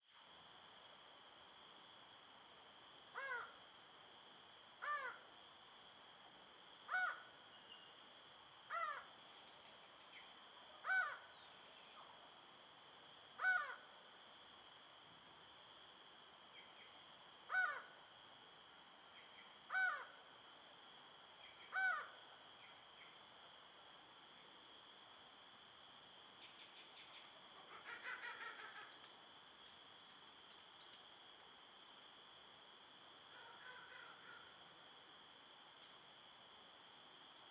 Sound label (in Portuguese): Acaua Cantando a Beira do Rio no Entardecer